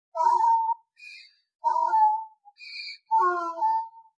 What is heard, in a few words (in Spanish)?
rebuzno burro